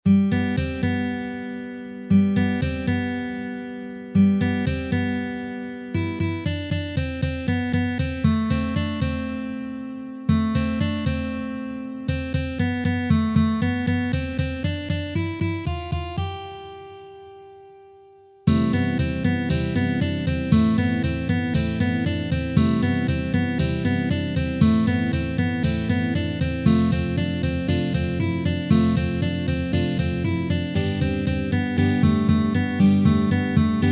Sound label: Fast Tempo, guitar